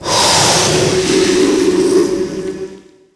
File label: shark die; DAoC